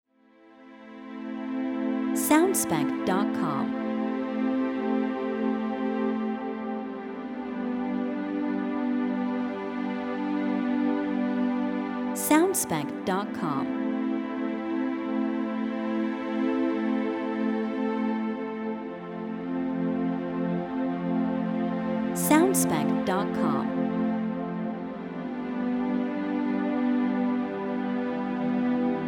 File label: Neutral Relaxing C Pad Loop